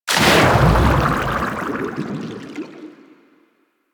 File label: Sharkwing Water Enter, Vehicles; Warframe